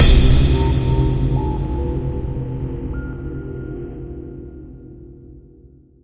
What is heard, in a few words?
stinger; Mirror's Edge (2008)